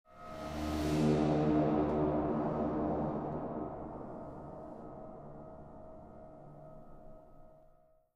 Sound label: stinger; Myst III Exile